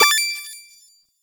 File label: Snake Game Eat; Warframe; Nineteeen Ninety Nine